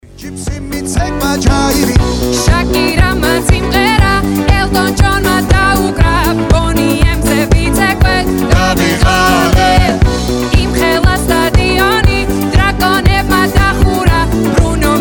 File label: georgian disco